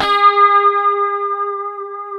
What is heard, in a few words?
A 4, Guitars STRAT; HYPED STRAT; Spectrasonics; Hans Zimmer Guitars VOL-1; 90's Sample CDs